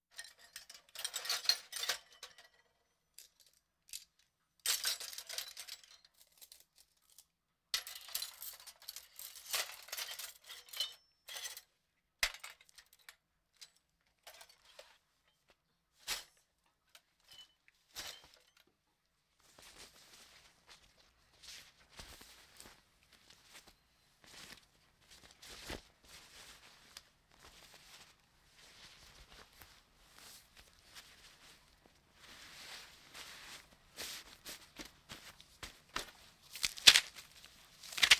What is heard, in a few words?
Hospital effects